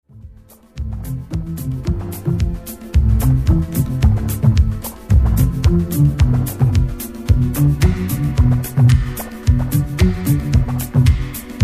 long instrumental version

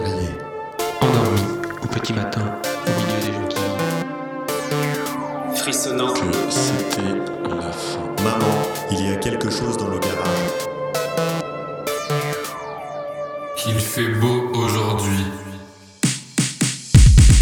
Electro French Dialogue

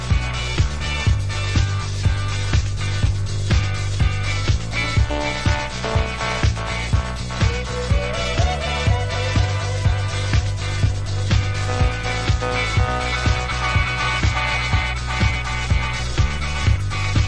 instrumental

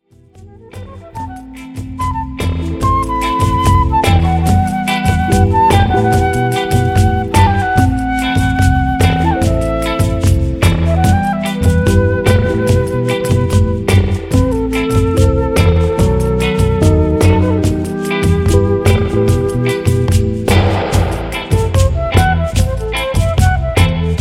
Quando Sera (flute version)

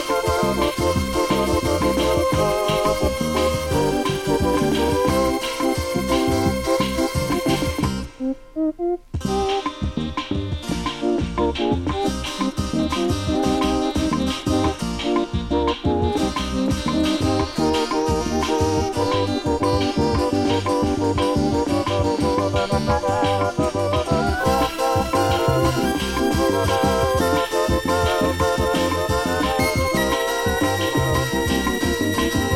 Synthi Bossa Nova

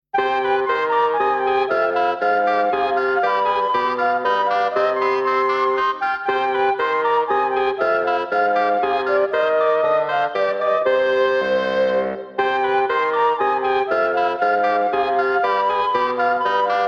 Oboe Bassoon And Flute

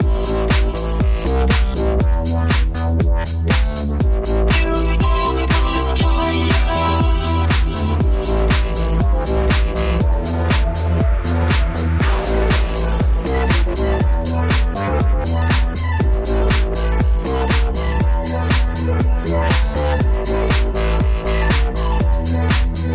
Bass Purr

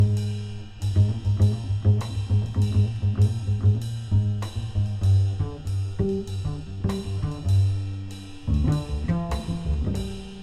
Blue Bass

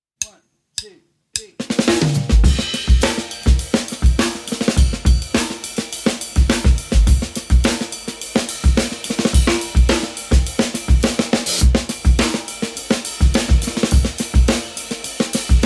BPM Rocket Break

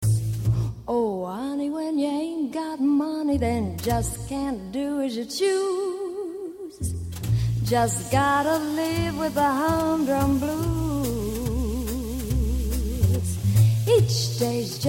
Hum Drum Blues

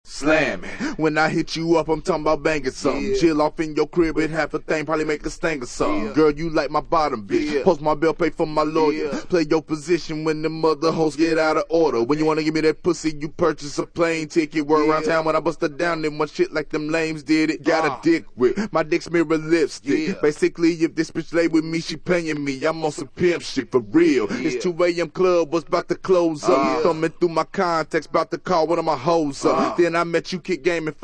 acappella; Hip Hop

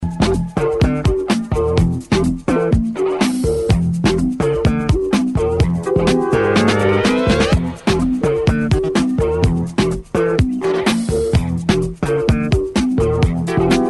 bass power

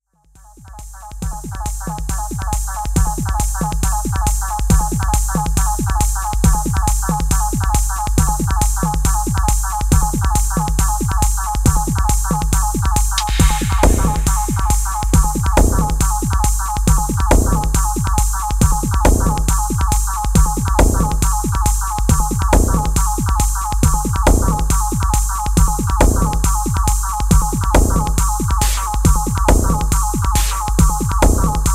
techno mixes

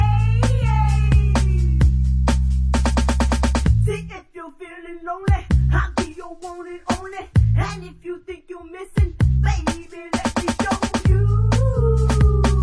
bass mix

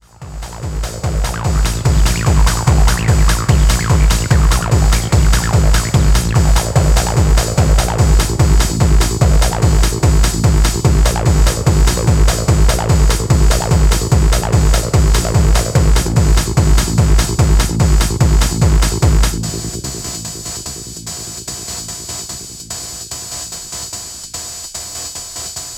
Urban Bass

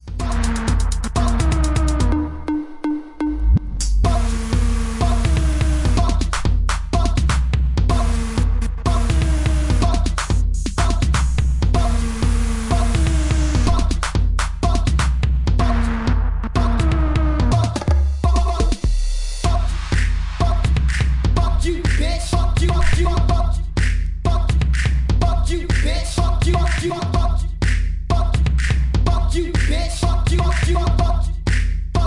beeps

